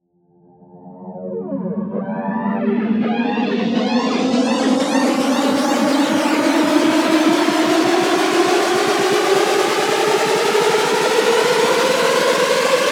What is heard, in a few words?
Riser - 128 BPM F; House; FX; Risers; Talkative Riser